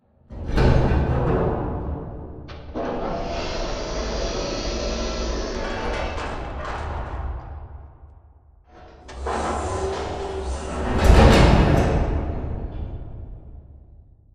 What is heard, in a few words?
Lower Arm First House Grab; Boomtown; Sideways Town; Titanfall 2